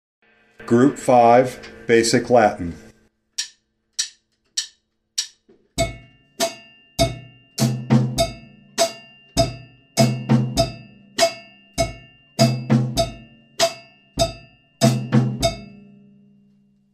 basic latin

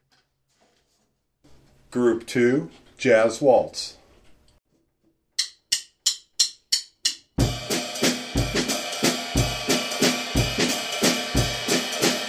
jazz waltz

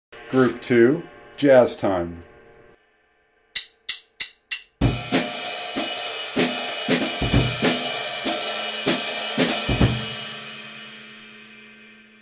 jazz time

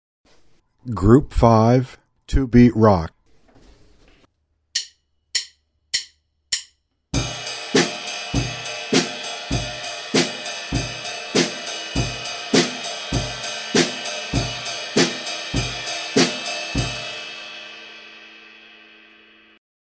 two beat rock

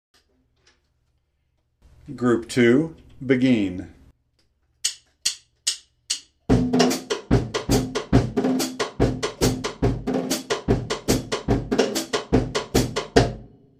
beguine

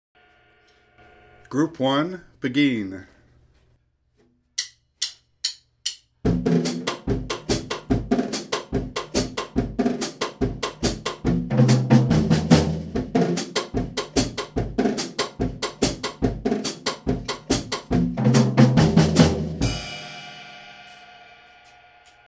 beguine

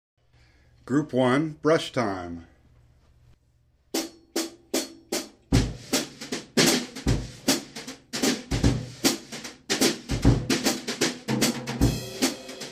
brush time

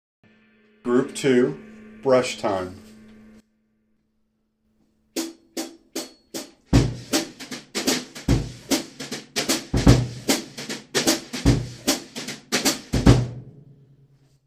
brush time

